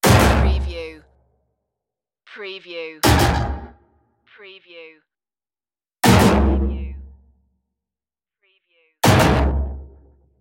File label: starship door slam